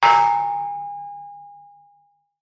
impact metal pole hit strike hard object resonate ring